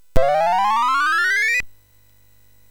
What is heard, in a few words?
Final Synth Sample, MOS6581R3; hvsc